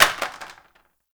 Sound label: Plastic Drop; Warframe; Ambience; Nineteen Ninety Nine